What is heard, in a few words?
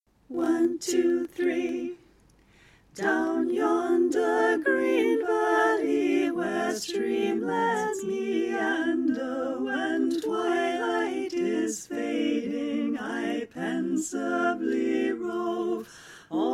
The Ashgrove soprano panned R; Folk Choir